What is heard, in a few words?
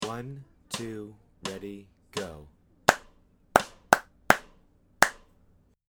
rhythm practice